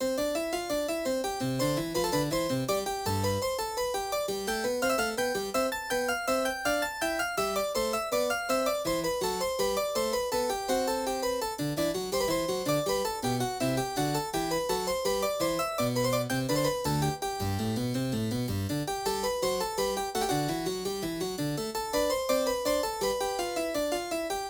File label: harpsichord, Yamaha NP-30; Demo Songs; harpsichord 2; invention no. 1